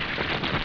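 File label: swamp, ambient; Quake; PainKeep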